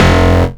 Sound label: S Bass (F), Synth Bass One Shots; Basses